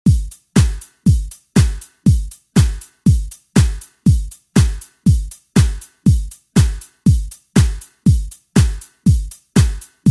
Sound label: 90s ReDrum; DJ Mhark